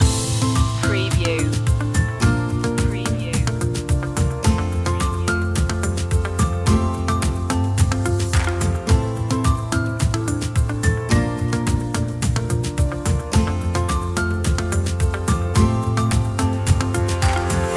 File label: taking flight loop